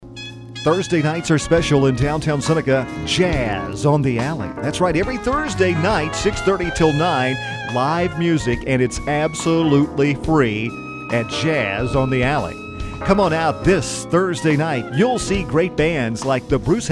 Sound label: Jazz